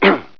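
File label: clear throat; Sound Effects Library 1.5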